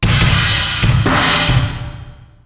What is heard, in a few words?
drums